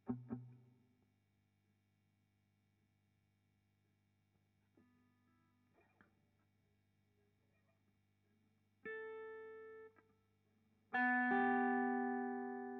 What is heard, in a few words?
GTR Royer